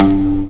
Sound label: hit air, Guitar; Notes